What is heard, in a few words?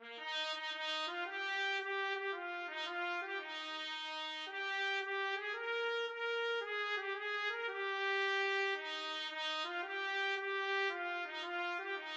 trumpet